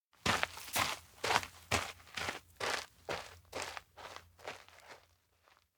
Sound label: steps outdoor gravel walk away; kurumi; anemoi